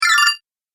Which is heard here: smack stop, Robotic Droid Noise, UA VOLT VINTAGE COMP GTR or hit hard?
Robotic Droid Noise